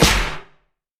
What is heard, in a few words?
huge snare sample D key; snare drum samples